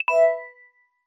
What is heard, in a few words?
sms ringtone; samsung j7 pro